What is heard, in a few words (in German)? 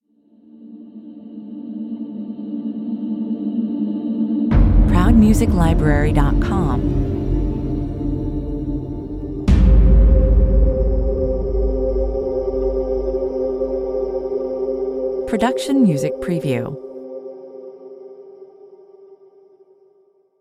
Reduzierte flächige Trailerpads mit soft wuchtigen Hits; Offen für viele Einsatzgebiete.